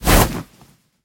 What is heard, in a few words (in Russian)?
shurshashej tkani